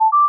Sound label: success; qrscanner